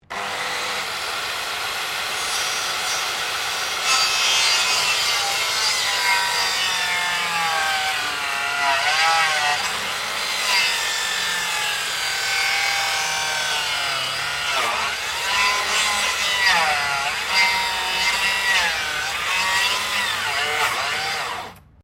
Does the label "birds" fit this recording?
No